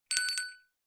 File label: bell small decorative bell ring